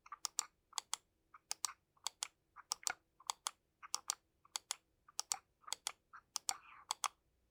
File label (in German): NZXT Lift Seitentasten